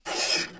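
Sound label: sounds of scratches on metal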